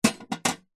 industrial wrench spanner metal small set down on metal tool box; zapsplat